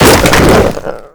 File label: Infested Jetpack Charge Lift; Warframe